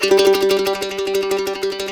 CEE TRILL G L, Blues Guitar

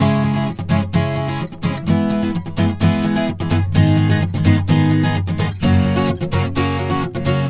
Acoustic Latin Guitar 128BPM C#min; Vengeance - Essential Clubsounds Vol.4; Guitarloops

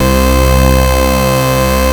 OB VOX B2; Hollywood Edge - Giorgio Moroder Rare Synthesizer Collection; OB 4 VOX.

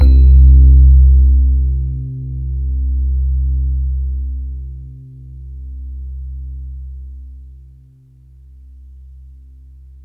TINE EPIA, KURZWEIL; BASIC-KW2000

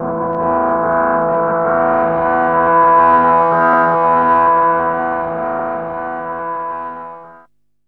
DR. LOOP, TRANCE; X-Static Goldmine 3

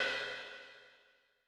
crash, Cymbal; Yamaha DTXpress-II

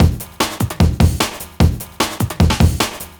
150, Drum Loops; KickTheCan; Electronisounds

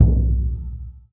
lo drum, Burundi; Spectrasonics; Heart of Africa

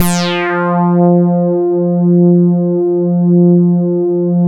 OBERSYN F4

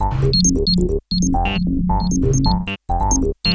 ARP, ARP LOOPS, House; Best Service ProSamples vol.10 - House; AKAI

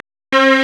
STRNG; CMP - Analogon

